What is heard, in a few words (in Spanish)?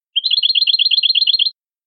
cascabel